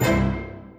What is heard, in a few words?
effect symbol appear hold